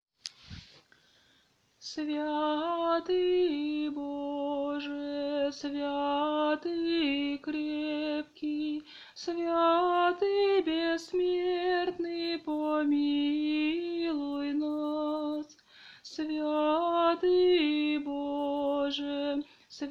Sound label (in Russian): Trisvyatoe 2 golos